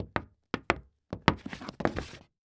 Foley Footstep Hard Sole Dress Shoe Walk And Skid On Hollow Wood Surface; Foley Footsteps